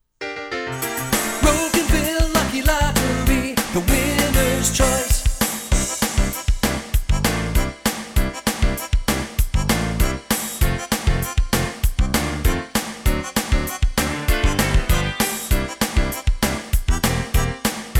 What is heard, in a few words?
Music Beds; Rocanville Lotto